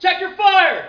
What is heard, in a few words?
check fire; specops